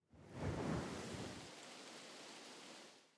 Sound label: wind gust; factorio